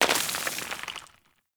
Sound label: Thorn Bow Stone Arrow Impact Default; Weapon Impacts; Player; Envoy Fey Bow